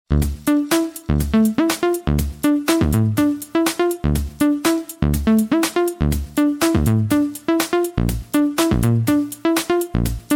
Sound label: mist iphone 8 ringtone